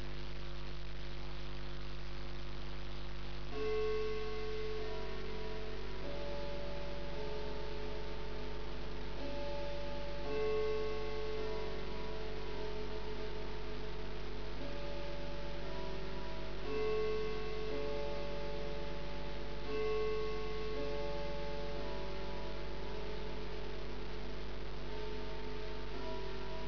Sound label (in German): uhr